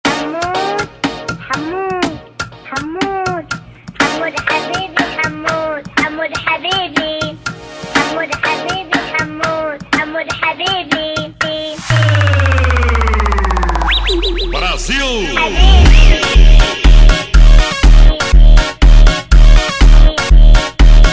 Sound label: Funk slowed